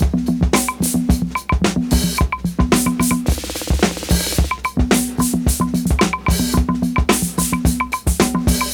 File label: jam